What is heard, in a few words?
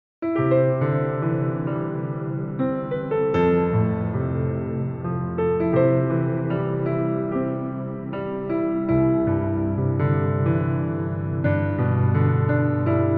Piano Improv; Be Thou My Vision